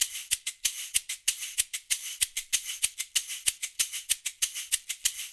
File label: LOOP, SHAKERS, Percussion